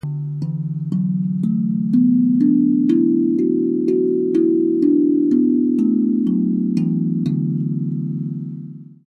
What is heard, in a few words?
Hapi Drum; Perc-melodisch